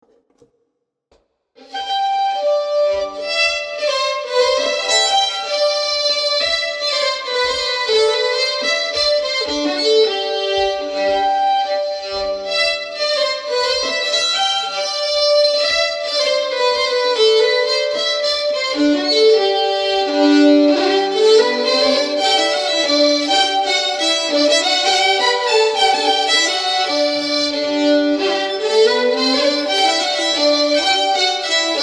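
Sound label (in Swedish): Polska Gökpolska, svensk säckpipa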